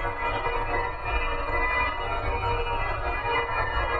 Tonal Re Draw, sparse soundscape

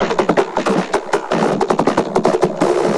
Vocobeat; Sonic Foundry (Sony Creative Software)

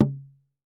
COCHITI, American Natives; E-MU Formula 4000 Series Vol. 4; Earth Tones

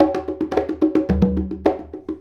Congas; Essential Percussion

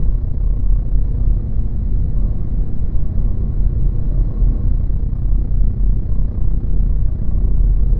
Drone Ambient; Drums & Drones

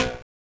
Conga, Genesis; Sonic 3; Launch Base; M8

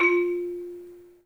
MARIMBA; 3D Sound; 3D Keys VOL-1

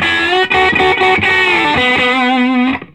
SOLO, Vintage Blues Guitar

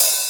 HH, Dance Mega Drums; Best Service; HH-HIP

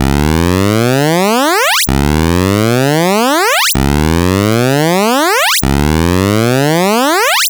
Game Boy FX Alarm Loop, chiptune; Nintendo Game Boy

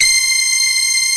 RICH BRASS; AKAI S6000 CD-ROM - Volume 1; BrassWind

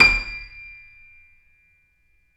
KEY D 6, YC7; Roland L-CD701

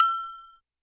Agogo H; YAMAHA RM1X

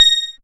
A#, PIANO; Big Fish Audio; Synclav FM

